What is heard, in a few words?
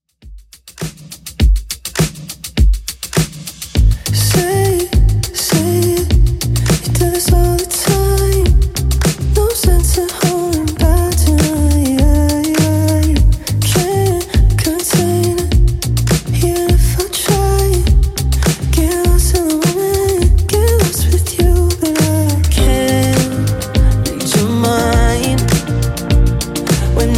Top40 Xtend, 102 bpm